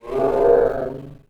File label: hurt; mahlendur